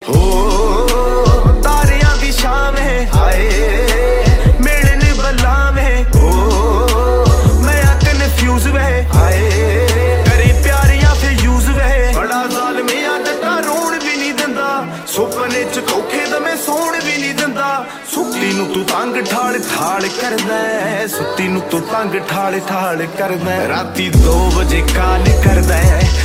Punjabi Ringtone

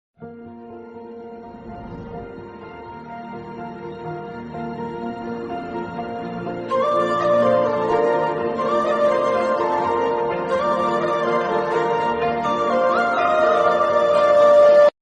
Instrumental, ringtone

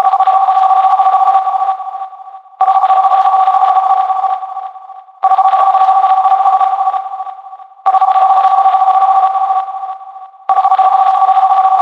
Vivo S1 Classic Bell

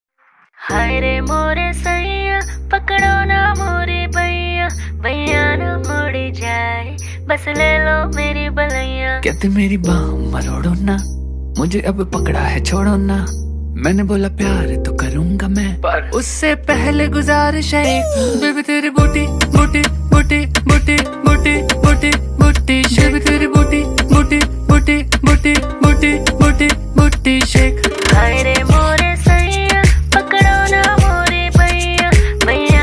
booty shake song ringtone

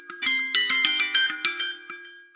Infinix S5 Pro Notification

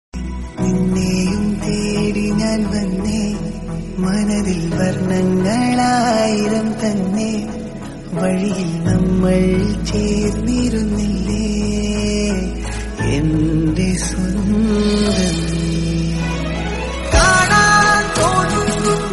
Malayalam Love Song

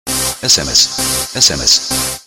Vivo Iqoo Neo5 S Sms, ringtone

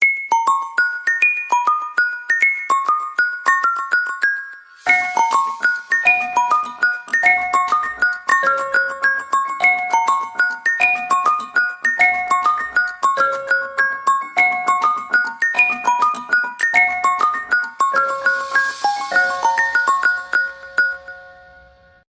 Xiaomi; Xiaomi 2021

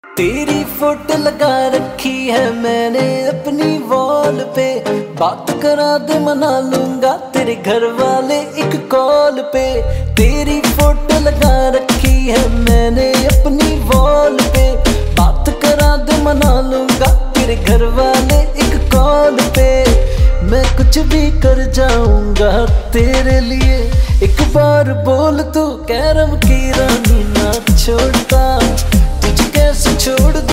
Song

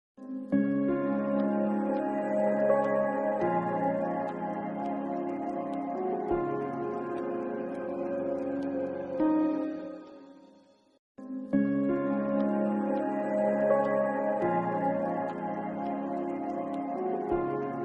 Instrumental, ringtone

